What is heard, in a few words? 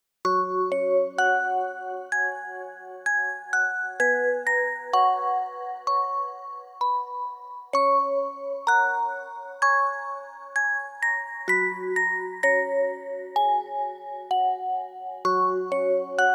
music box